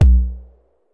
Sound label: Style Basedrum